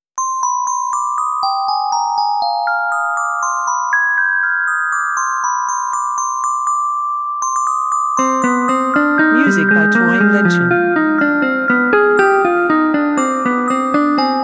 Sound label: Invention with bells